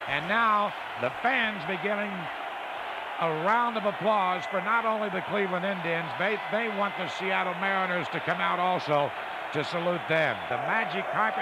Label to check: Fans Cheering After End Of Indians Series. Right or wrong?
Right